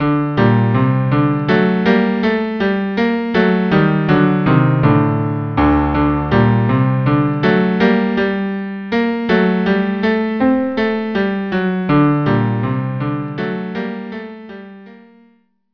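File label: cello cello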